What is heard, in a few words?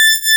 PULSE MOD A5, ARP Synths; E-MU Formula 4000 Series Vol. 3; Analog Odyssey